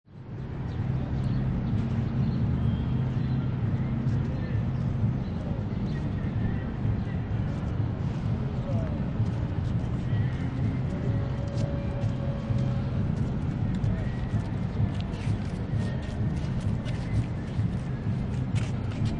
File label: City Park Ambience, Battery Park. Close to harbour, people walking by; NYC; relaxing in the park. Heavy city traffic in the distance.; City Sound Effects